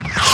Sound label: combat atmosphere; World of Tanks